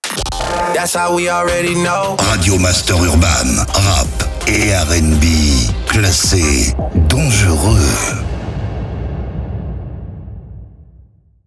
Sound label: JINGLE RADIO; RADIO MASTER URBAN; Habillage